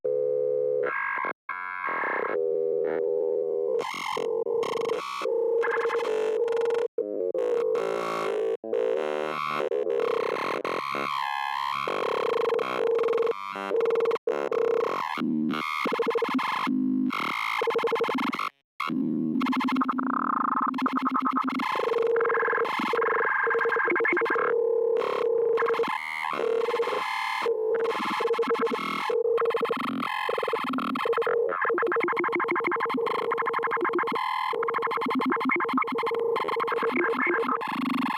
Another connection, Hologram Room; USO

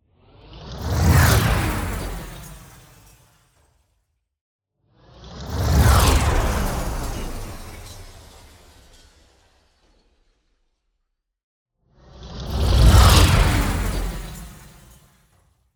Whooshes; SoundBits; Whooshes & Impacts 2

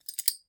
belt Handle; Kenney's Sound Pack; RPG Sounds

